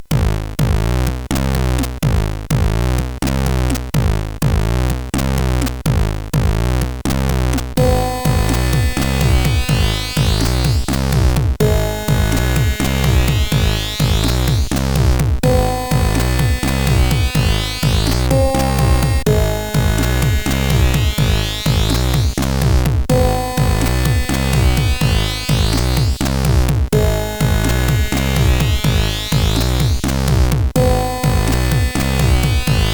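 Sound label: Nice Trance, MOS8580R5 sid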